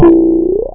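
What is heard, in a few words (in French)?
Ressort, spring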